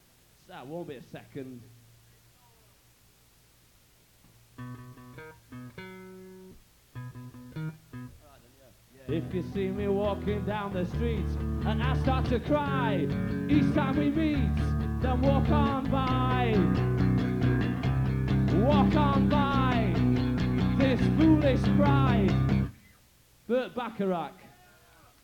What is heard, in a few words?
Little Ditty To Fill Space Between Songs, live; 1993-04-29